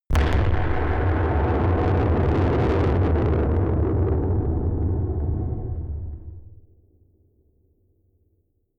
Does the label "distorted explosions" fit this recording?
Yes